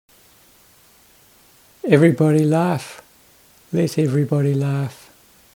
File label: laugh